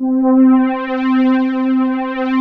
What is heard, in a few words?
JUNO STR; USB Soundscan vol.49; Analog Fantasies; AKAI